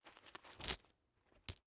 Coin Put Coins In Pocket; Foley

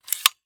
Industry Tool Staple Gun Open Chamber

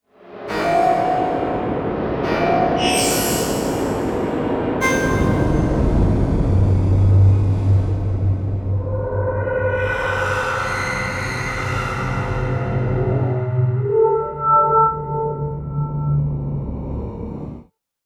Imaging Electronic Whoosh Digital Beeps Screech; Production Element Title Imaging

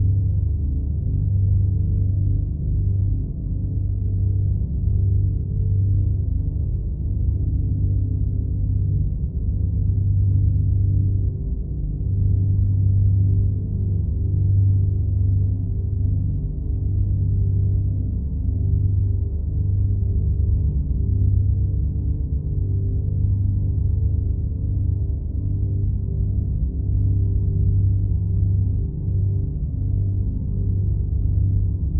Drone Synth Strings Mellow Rich; Production Element Imaging; AdobeCC

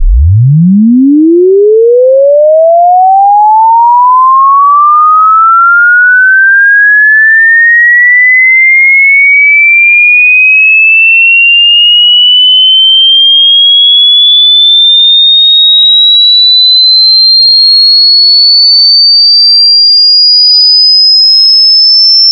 sine sweep linear; Tones

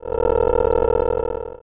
Sci-Fi Electronic Energy Distortion; Science Fiction

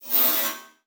Multimedia Internet CD-Rom Flash Wipe

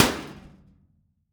Impact Metal Impact Trash Can Hit; Impacts

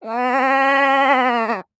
Animal Mammal Herbivore Sheep Lamb Bleat; animals; AdobeCC

